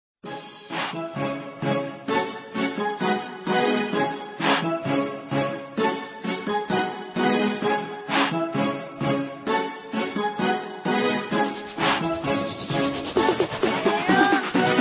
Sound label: instrumental